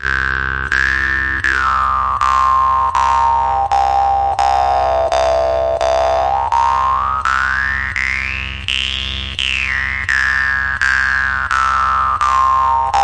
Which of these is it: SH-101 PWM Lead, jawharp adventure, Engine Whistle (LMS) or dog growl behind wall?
jawharp adventure